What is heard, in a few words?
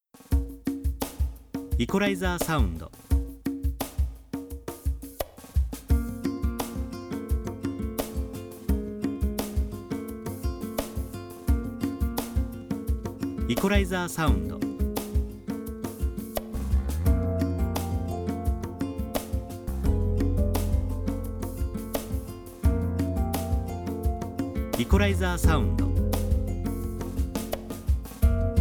perc eq